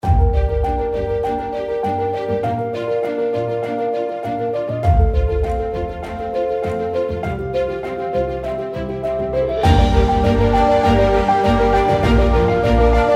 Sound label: Short Cinematic